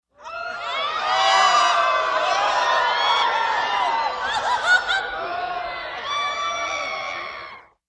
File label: Theater Crowd Scream